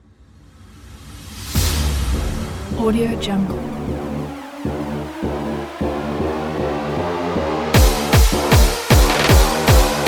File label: trap